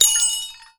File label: BELLS